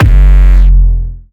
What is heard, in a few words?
Bass